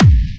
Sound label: Trance Kicks